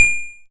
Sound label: YM Tink; SFX; CHIPSHOP DELUXE; CHIPSHOP HITS